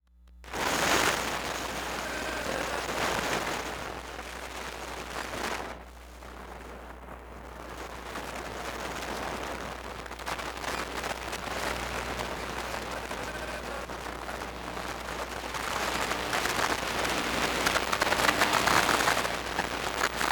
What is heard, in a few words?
ELECTRIC MODEM, Noise, Connecting, Broken Radio, Interference, Buzz; Articulated Sounds; Electromagnetic Household Fields